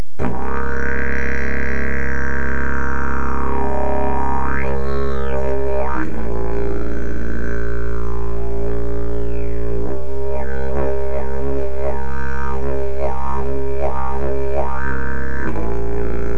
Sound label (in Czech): jilm, didgeridoo; riduoo